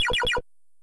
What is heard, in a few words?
dig; C64; LodeRunner TotalRecall